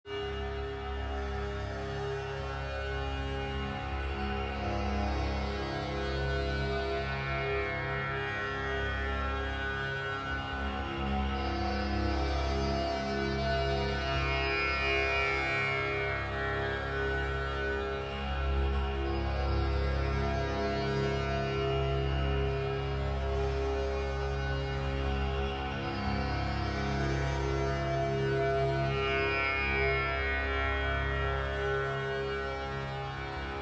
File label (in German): Klangliege